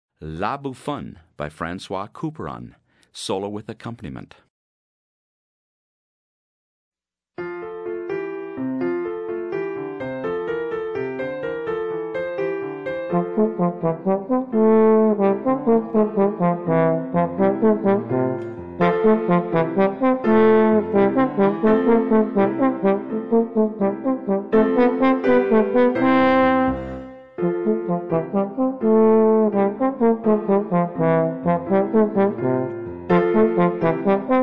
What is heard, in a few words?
Trombone and Accompaniment; Grade 11 Solos